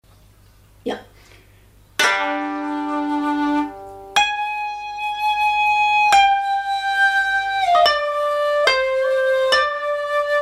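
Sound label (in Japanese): hototogisu, lesser cuckoo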